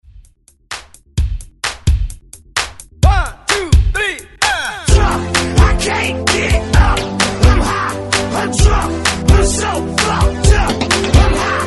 80s Hype Re Drum 130Bpm; DJDOLLS; remixplanet

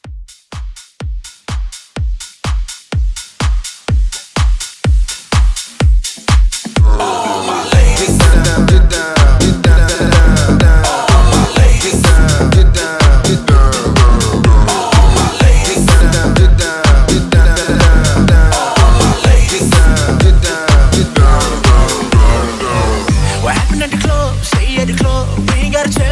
House Mixshow

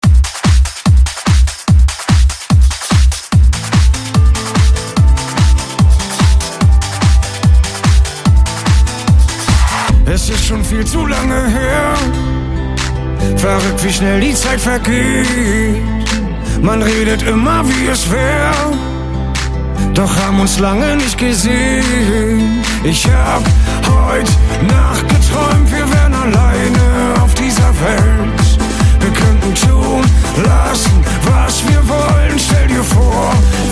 extended Schlager redrum

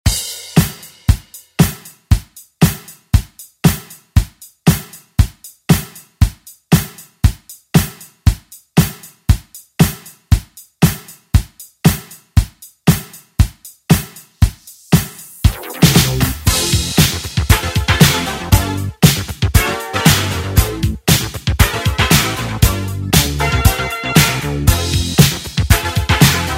80s ReDrum; DJ Mhark

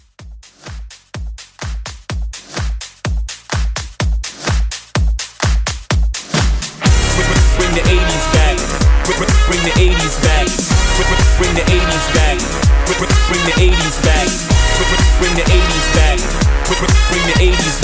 80s Drum Hype Intro 126

